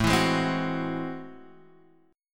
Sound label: A Minor Major 13th, down, Guitar, Open G